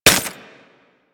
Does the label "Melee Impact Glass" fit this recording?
Yes